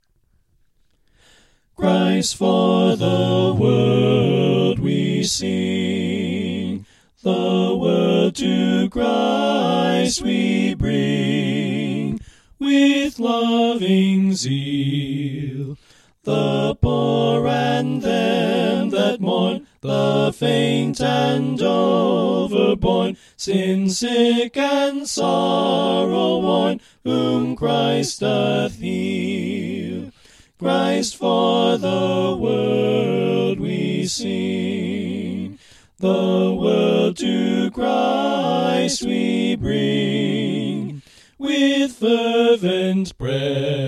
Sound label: Soprano Right